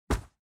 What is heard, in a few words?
open inv